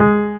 Synth; osu

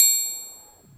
Triangle

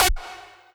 lead

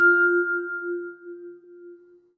vibraphone F5

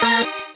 normal hit normal

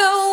vocal

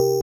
BELL; osu

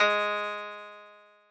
Koto G#2

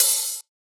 hihat; osu

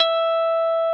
N.H.

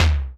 TOM

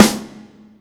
normal hit clap

